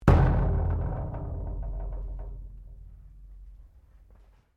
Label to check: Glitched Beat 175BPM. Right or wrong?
Wrong